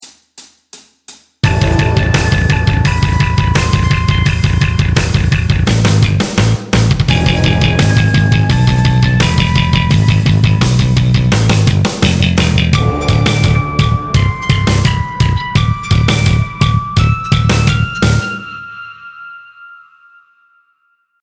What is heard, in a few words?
Lead Guitar Backing Track; Brilliant Lead Guitar Secrets